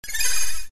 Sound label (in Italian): suoneria botton; beep